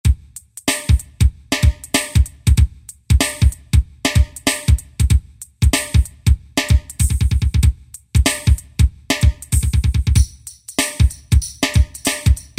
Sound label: Hip Hop patterns, Boss DR-770; Preset Patterns